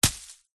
foley hard solid object fall hit ground dry grass leaves thud